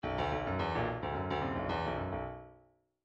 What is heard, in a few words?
midi grooves funk